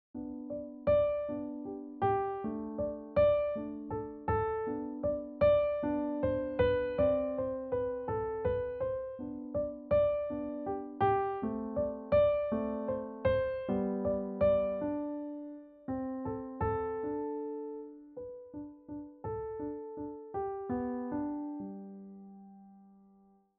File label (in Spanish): Valses